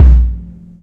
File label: BD, korgdrums; Korg Electribe; ESX-1; electribrary-3